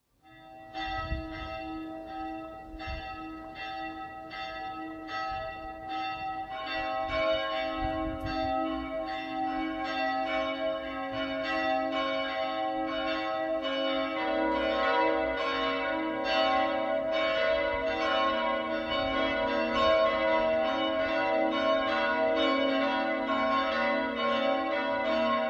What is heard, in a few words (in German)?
Teilgelaeut auf gis, Domglocken; Englische Jagd